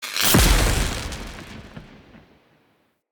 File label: Shock Mote Strike